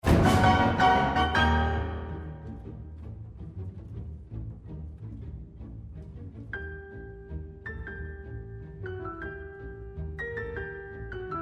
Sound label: Piano Con